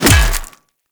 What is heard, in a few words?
Melee Hammer Impact Flesh; Hammers